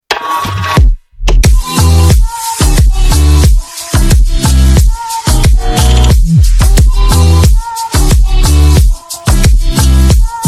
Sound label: instrumental